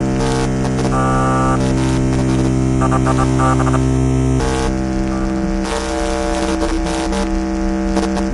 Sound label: Broken Console, ambience spaceship